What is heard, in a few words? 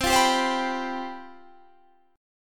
C Major 7th Suspended 4th, C, down, Guitar, Standard; strummed